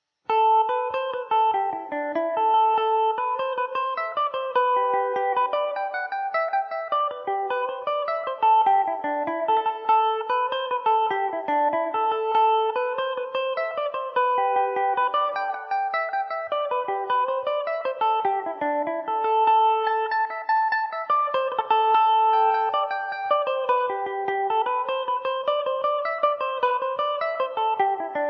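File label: A dorian, Irish mandolin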